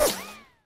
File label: rinochet